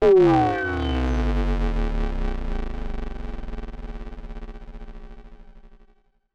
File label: decelerating sci-fi drone